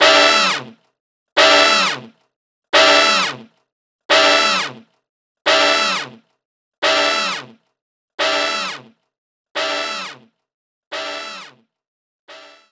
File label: Basketball Hoops; Sfx Basketball Hoops